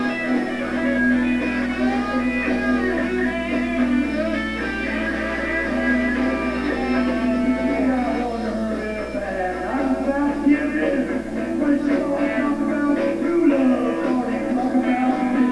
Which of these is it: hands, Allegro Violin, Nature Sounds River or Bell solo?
hands